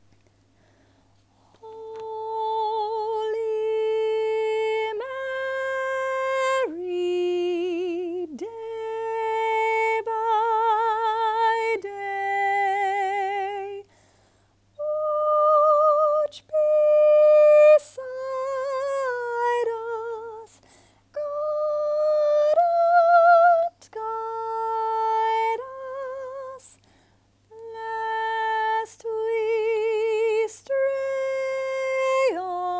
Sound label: nuns chorus soprano